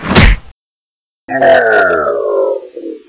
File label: punch